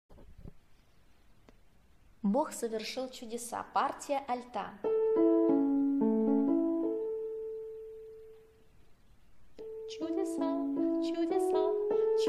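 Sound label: alto; Bog sovershil chudesa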